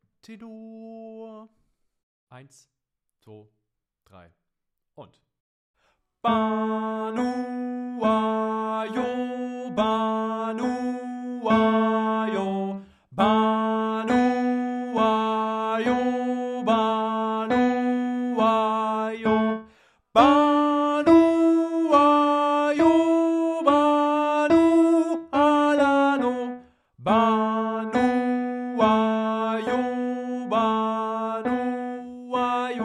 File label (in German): Tenor allein; Banuwa